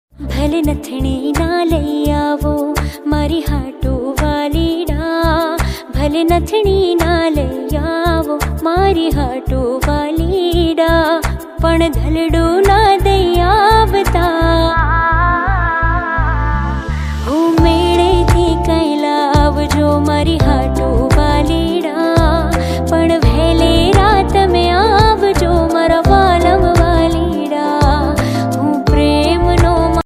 gujarati ringtone

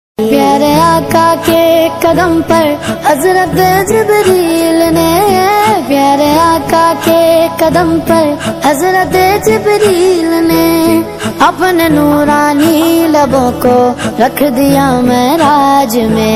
islamic ringtone

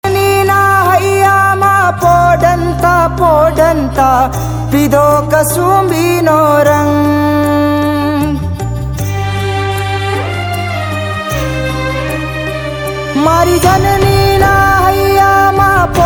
gujarati ringtone

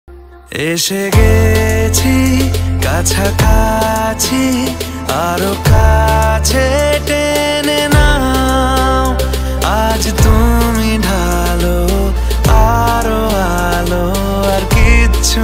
bengali ringtone

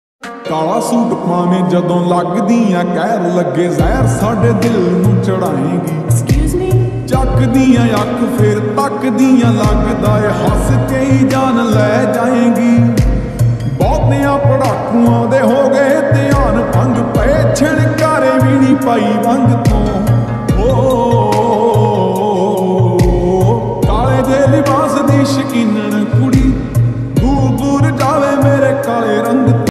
lofi remix punjabi ringtone

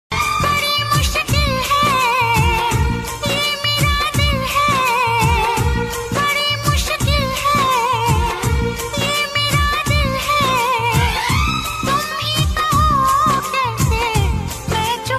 female hindi ringtone

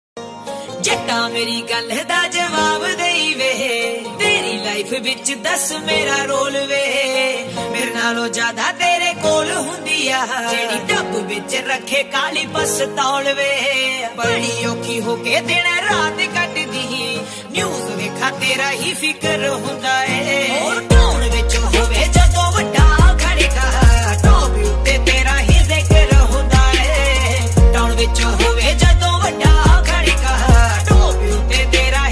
panjabi ringtone

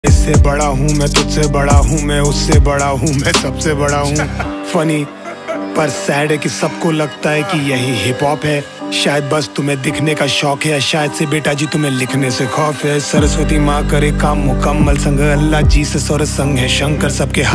hindi rap ringtone

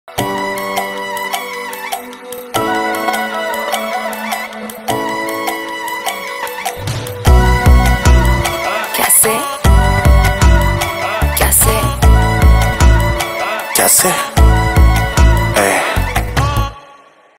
BGM ringtone

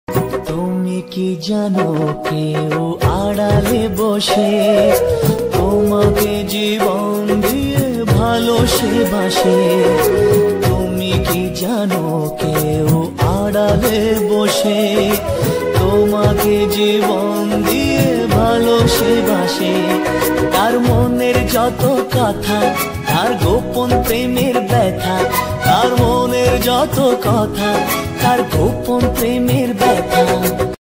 male bengali ringtone

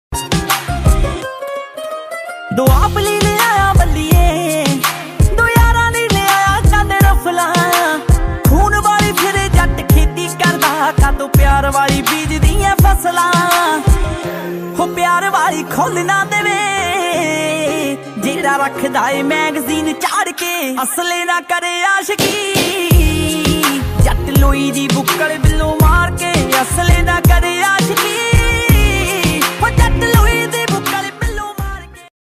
panjabi ringtone

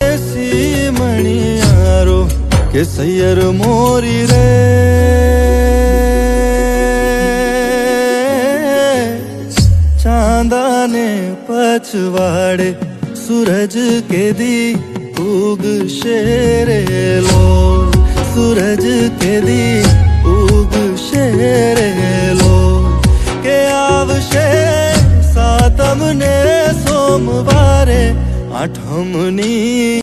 Male gujarati ringtone

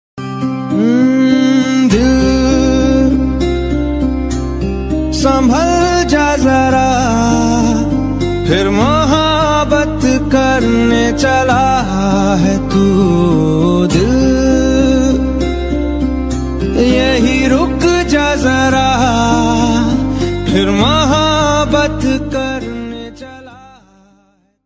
Sad Ringtone hindi Ringtone

